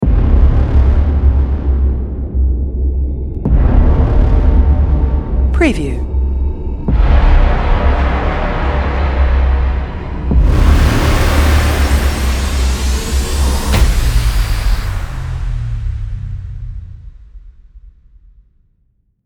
Trailer Opener - Cinematic Dark Blockbuster Intro Opener Outro